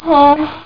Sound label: pant; Battle of the Superstars